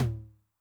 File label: MOD Noise, 909mod MID TOM; classic machines; MT - MID TOM